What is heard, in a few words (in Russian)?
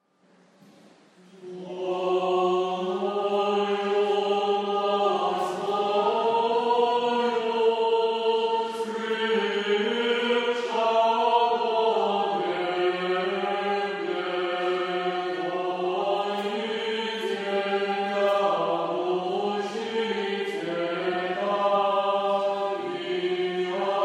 Молодежный хор г. Новосибирска Волною морскою. Ирмос 1 песни канона